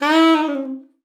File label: sax e4, Saxophone slides; Session Instruments